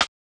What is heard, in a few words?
Snare Perc